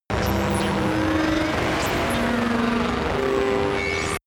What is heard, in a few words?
facility error, sciene-fiction